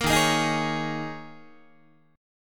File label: Eb 7th Suspended 4th Eb, Guitar; 13,11,11,13,12,12; 4A,4E,5A,5D,5G#,6C#; strummed